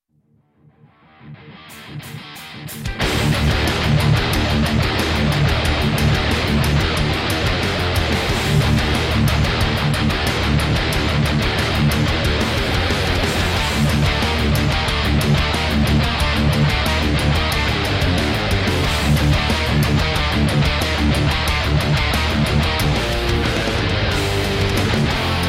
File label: Metal